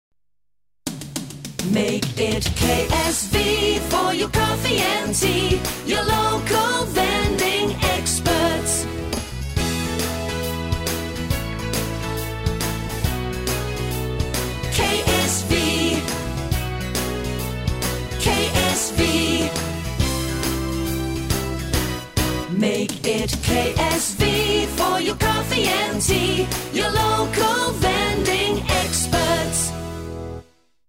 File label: Vending Radio Jingle